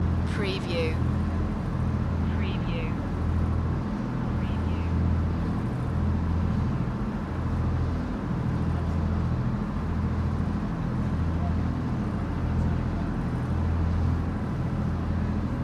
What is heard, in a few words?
ferry ride ambience loop